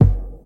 vinyl low tone latin kick single shot F sharp key, kick drum sound